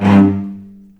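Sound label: G1 arco short cello, right; East West; The Ultimate String Collection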